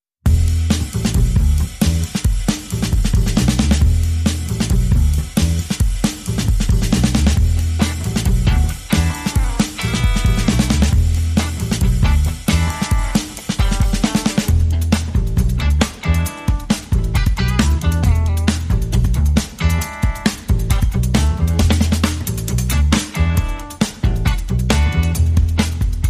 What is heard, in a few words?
No Horns